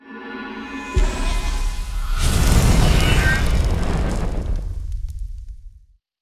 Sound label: Sound Boss Skill Reinforce Incinerate